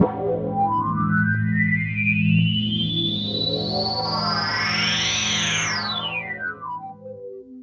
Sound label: chords